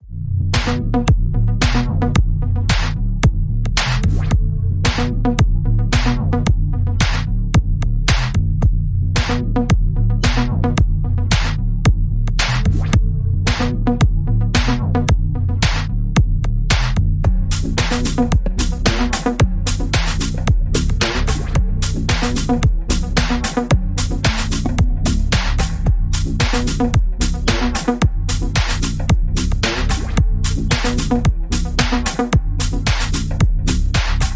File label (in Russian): muzyka v mashinu bass boosted